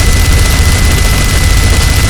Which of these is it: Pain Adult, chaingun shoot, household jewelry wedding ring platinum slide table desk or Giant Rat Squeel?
chaingun shoot